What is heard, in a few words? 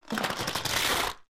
fracture, battle